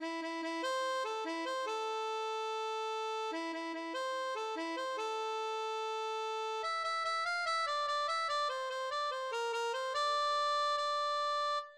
harmonica

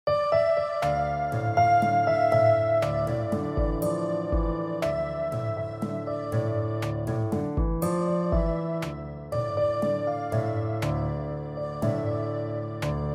keyboard

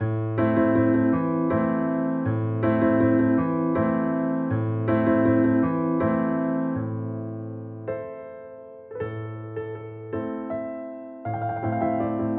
piano

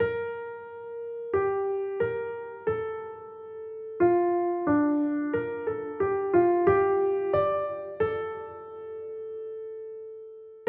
piano

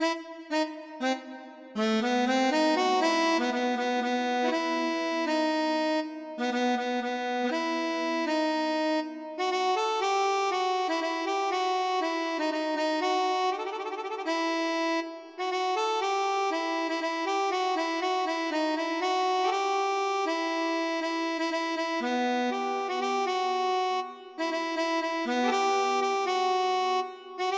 harmonica

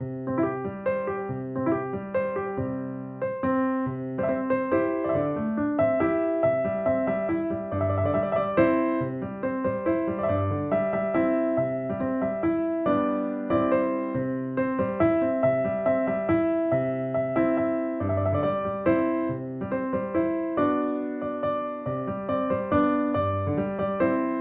piano

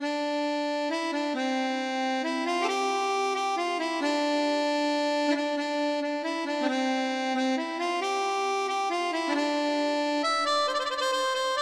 harmonica

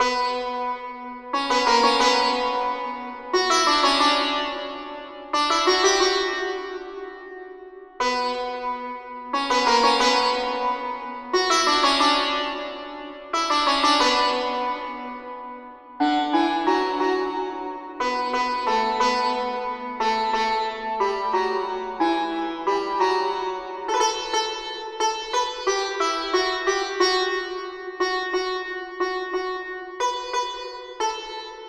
santoor

